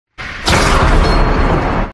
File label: Wheel Spin Sound; Mahoraga Adaptation